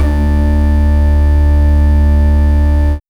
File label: PIPE BAS, Bass Analog & Synth; USB Soundscan vol.12; AKAI